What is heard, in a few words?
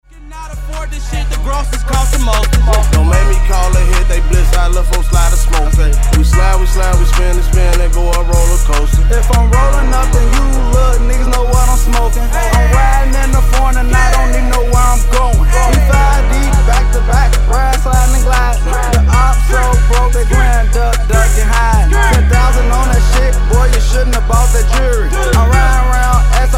HIP HOP II